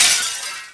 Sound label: glass, debris; XDM